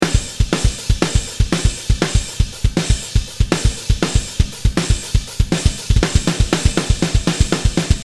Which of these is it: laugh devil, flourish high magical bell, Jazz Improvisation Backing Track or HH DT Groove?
HH DT Groove